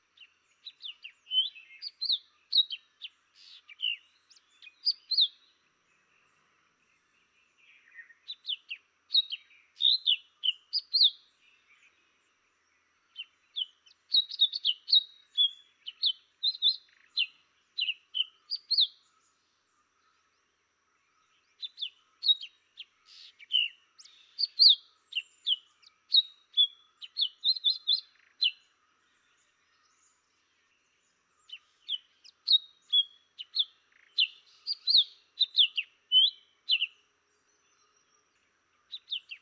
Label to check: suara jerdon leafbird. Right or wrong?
Right